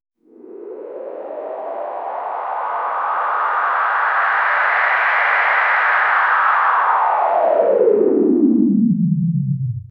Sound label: synth pad raising from lo